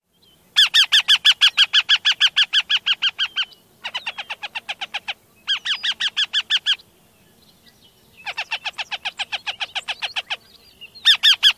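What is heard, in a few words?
alarm calls of pair at nest male calls first, northern harrier; NY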